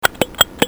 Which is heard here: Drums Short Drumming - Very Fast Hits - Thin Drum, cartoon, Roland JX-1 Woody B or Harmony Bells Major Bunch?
cartoon